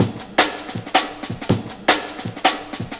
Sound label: Physical Break, breaks